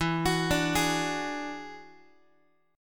E 9th E down Guitar; E9 arpeggiated; x,12,9,12,12,x; JGuitar

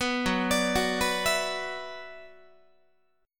F sharp 7th Suspended 4th Sharp 5th, Guitar; arpeggiated; F#7sus4#5; 14,14,17,16,15,15; 4A,4E,5A,5D,5G#,6C#

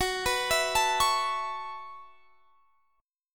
B 7th Suspended 2nd Suspended 4th, chord

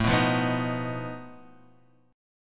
B 7th Flat 5th A, guitar chord

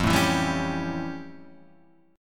F Augmented Major 9th F Guitar Drop D

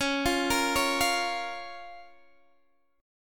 C sharp 6th C sharp down Guitar DADGAD

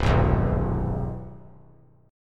C Augmented Major 7th; strummed; JGuitar

